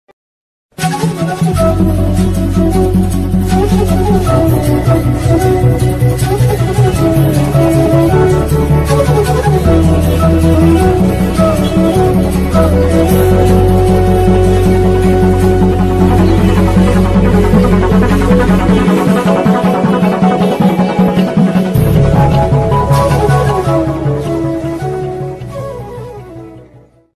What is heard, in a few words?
Bgm Ringtone tamil ringtone